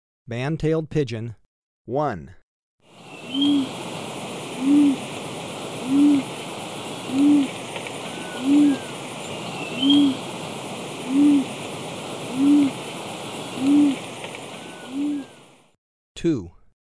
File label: Band-tailed Pigeon; S. America